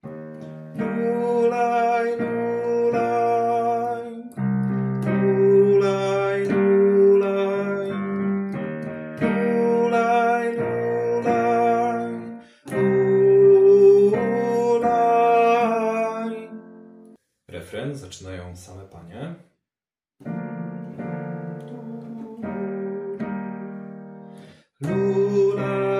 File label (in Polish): Tenor; Lulajze Jezuniu; nagrania glosow warsztaty2026